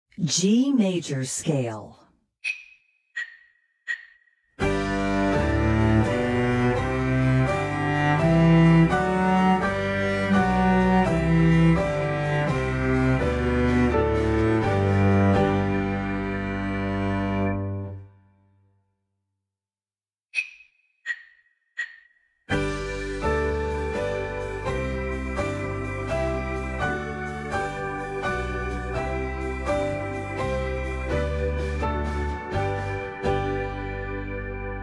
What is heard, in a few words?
G Major Scale